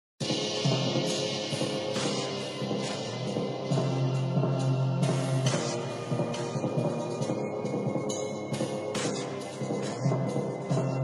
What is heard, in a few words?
super slowed reverb